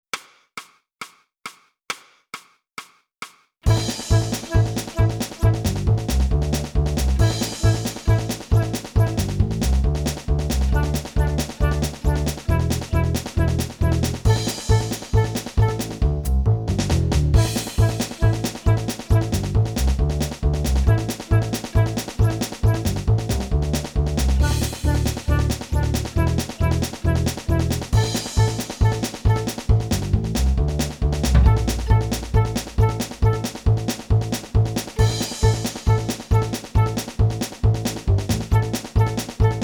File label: flute